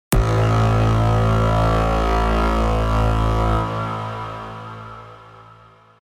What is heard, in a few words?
Horn A